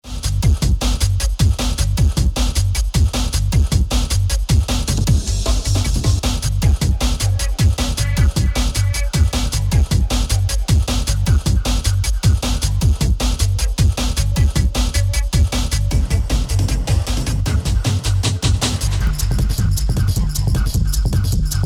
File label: electronica